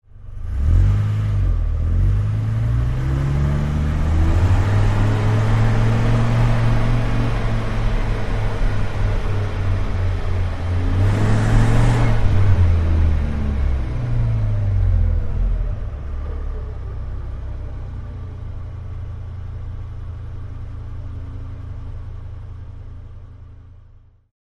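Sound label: Dodge Ram Fast Drive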